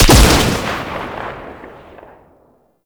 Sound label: Pistol Alt Fire Shot; Weapons; Scaldra